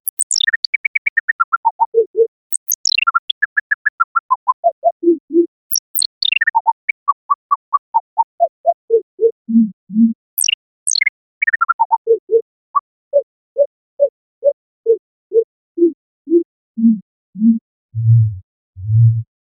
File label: Synthetic Chirping